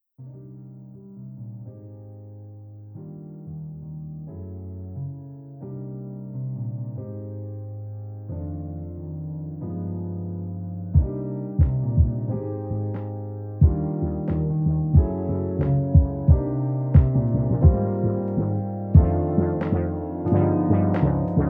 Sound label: new beat